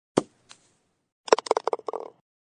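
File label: miash golf v lunke, golf ball in hole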